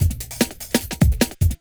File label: LOOP, Drum & Bass, 150 BPM